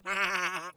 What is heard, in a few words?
hahahaha